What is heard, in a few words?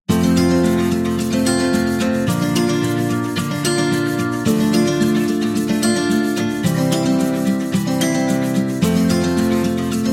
Wedding Intro, wedding music